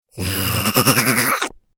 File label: Little Devil Laughing Bite, monster